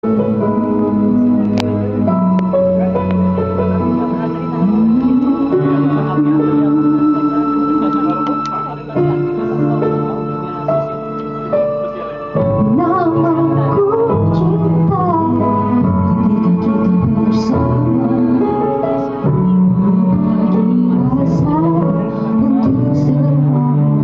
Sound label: karaoke